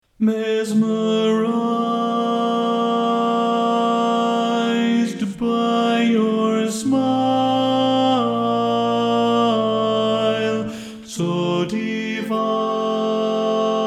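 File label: Baritone, barbershop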